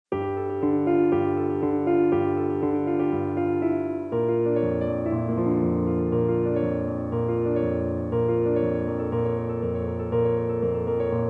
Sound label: Piano